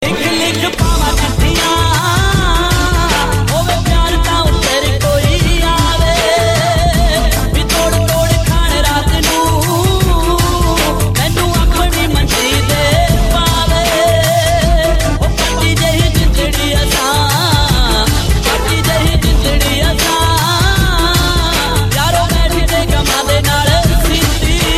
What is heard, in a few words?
punjabi ringtone